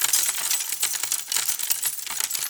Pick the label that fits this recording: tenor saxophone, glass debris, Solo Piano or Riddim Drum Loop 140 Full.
glass debris